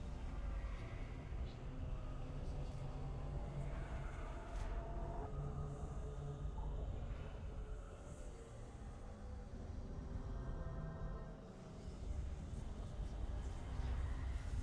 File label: amb blackmist